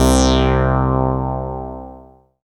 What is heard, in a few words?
SYN DIGI, SYN MicroWave; Sound & Vision - Gigapack I CD 2